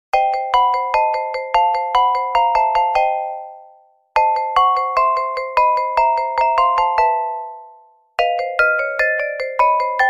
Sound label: Wonky Xylophone